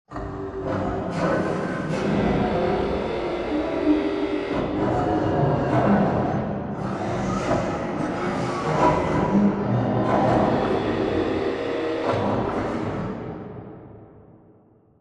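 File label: Assembly Furniture Arm Distant; Boomtown; Titanfall 2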